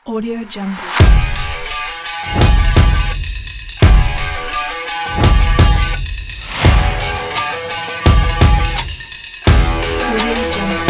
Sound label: action trailer rock big beat